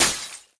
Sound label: glass